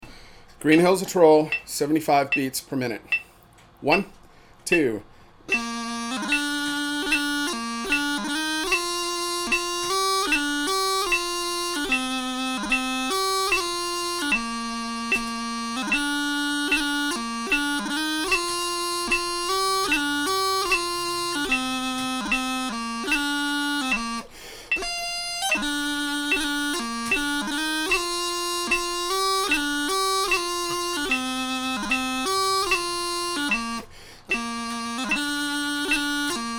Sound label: 75bpm, bagpiper; Green Hills Of Tyrol